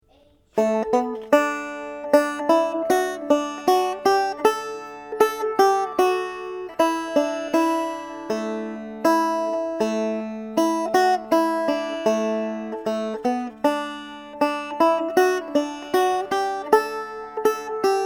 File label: simple melody